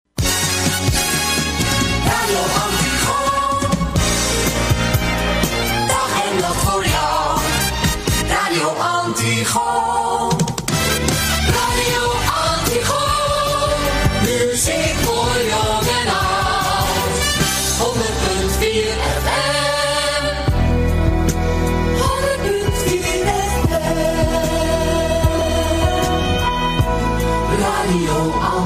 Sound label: jingles; Antigoon Antwerpen